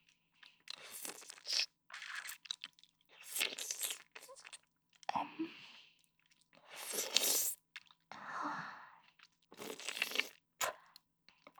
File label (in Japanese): フェラ音